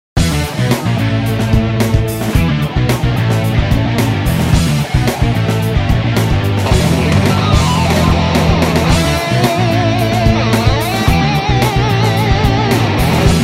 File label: Funky Metal